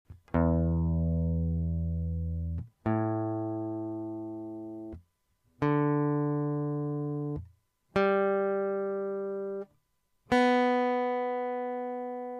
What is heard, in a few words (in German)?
stimmen, gitarren; E A D g h e